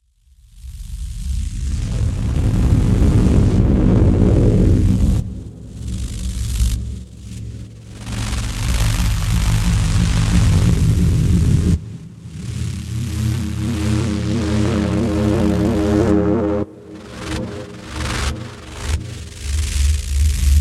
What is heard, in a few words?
Interference Field Transmission